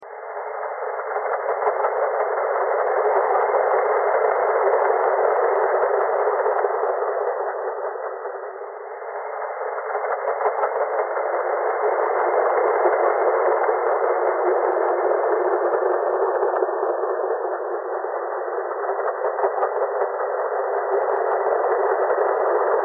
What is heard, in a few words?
thread simplebuzz